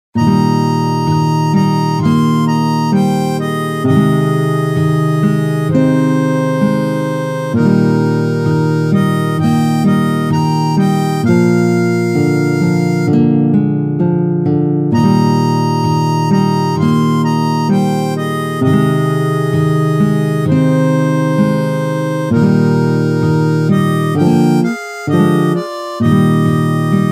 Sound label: What A Friend We Have in Jesus Harmonica